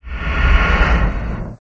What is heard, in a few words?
Water Baridon skill